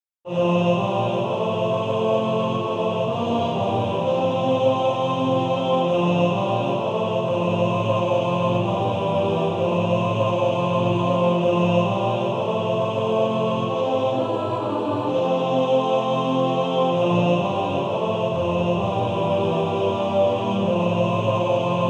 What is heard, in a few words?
Male Choir TTBB